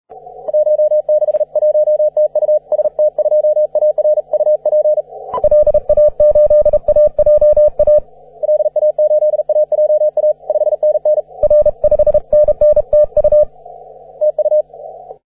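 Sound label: 17m continuous wave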